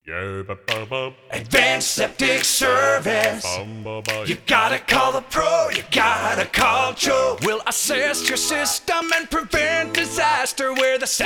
Full Sing, acapella jingles; Advanced Septic

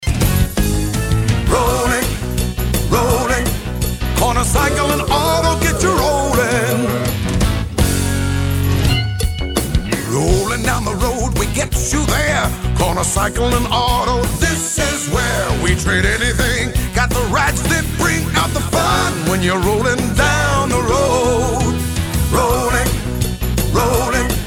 clothing store jingles; Corner Cycle

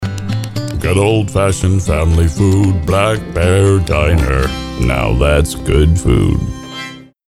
restaurant jingles; Black Bear Diner

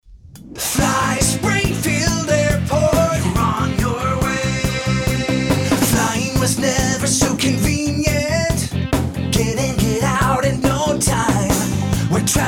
Full Sing, airline jingles; Fly Springfield

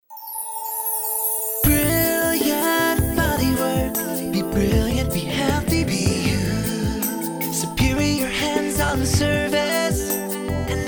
spa jingles; Brilliant Bodywork

